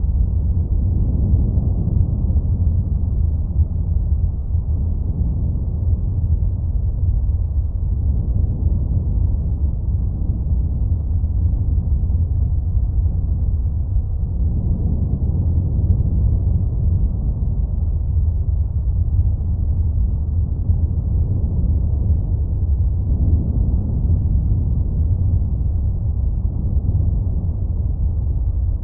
ambience low rumble; soundscapes; occultists

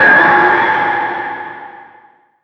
sword transform

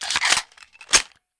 9mm reload, pistol; weapons

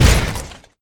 run

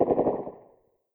fog shooting

